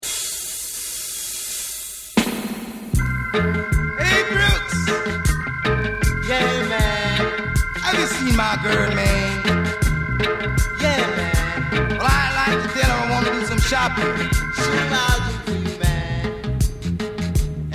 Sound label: Punk Reggae; Virgin